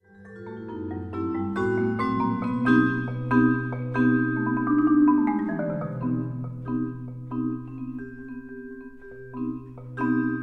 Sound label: Vibraphone and Marimba; Rhapsody for Vibraphone and Marimba